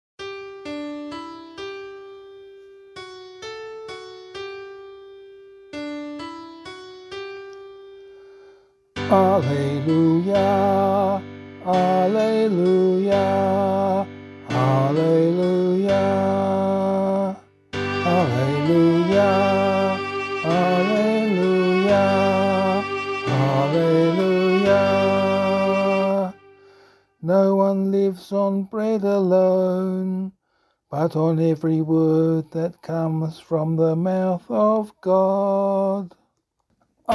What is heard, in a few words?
Ordinary Time 18 Gospel AB - vocal, Gospel Acclamation